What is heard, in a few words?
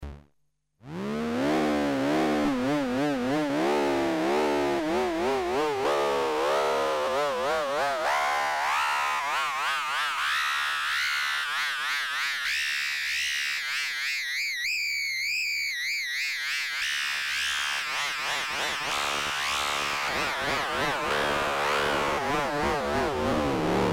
MS20; FreqBox